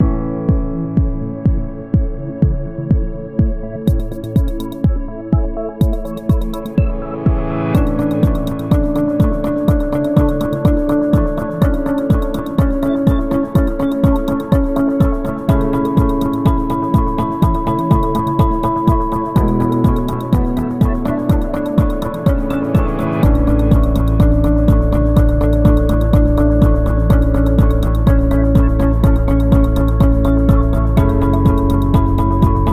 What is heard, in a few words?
Corporate Style; corporate music vol 9